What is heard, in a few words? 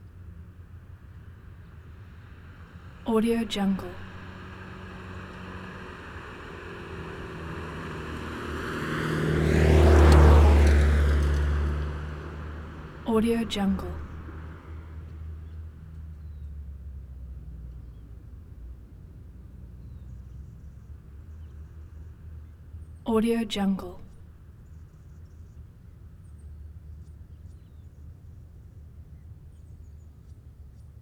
birds